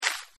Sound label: serve food to standing customer; Delicious Emilys Childhood Memories